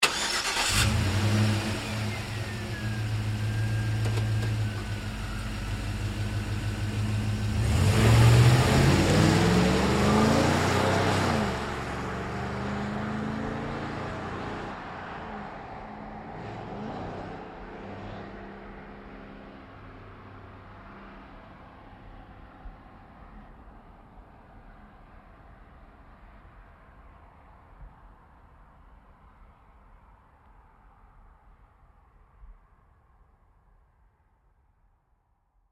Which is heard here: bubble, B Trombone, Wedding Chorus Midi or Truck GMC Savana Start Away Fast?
Truck GMC Savana Start Away Fast